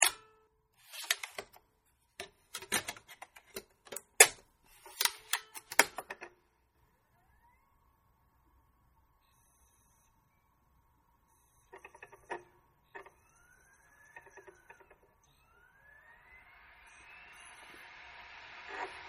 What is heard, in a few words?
Laptop DVD, Laptop Dvd Drive Reading Disc Media; Computers; Electronics